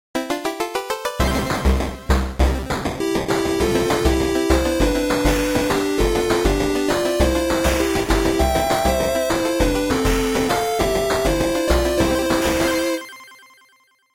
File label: 8-bit, happy birthday